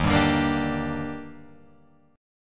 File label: Eb Major 7th, Guitar, Open F; EbM7; strummed; JGuitar